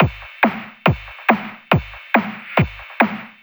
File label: LoFi Beats, 140 BPM